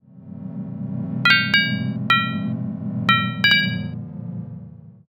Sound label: tavern